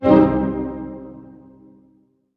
HARP HITS C MIN, Orchestra; Sound On Sound - Peter Siedleczek's Orchestra

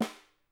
SN, Percussion

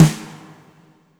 FAT SNR L, WARM BALLAD; Spectrasonics; Burning Grooves CD2

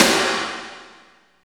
SNR, Tekno Industrial; East West

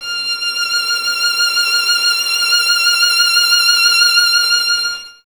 violin; Miroslav Vitous; Solo Instruments VOL-2; Solo lnst. II

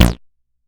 bass stabs; FutureRetro FR777

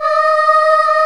CHOIR B3; AKAI S-Series CD-ROM Sound Library VOL-2

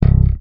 PLECTRON FX C1; Xsample VOL-1

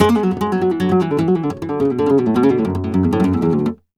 Flamenco Guitar Remates De Pulgar, d

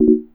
CHORD, Drum & Bass, 130 BPM; Ueberschall; Drum & Bass Resonance

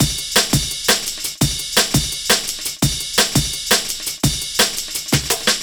Jazz Break, Drum & Bass; Fluid Dynamics

